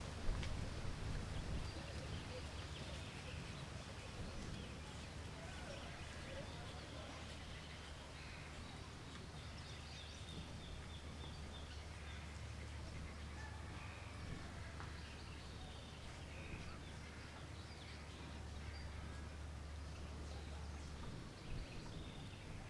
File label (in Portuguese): Ambiente mata perto de estrada com grilos sapos muito agudo e mosca; ambientes naturais